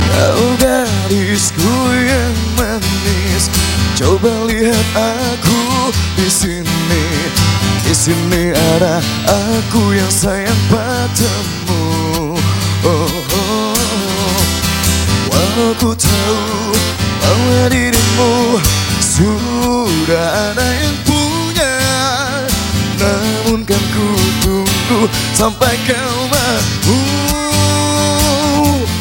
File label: Acoustic; Puspa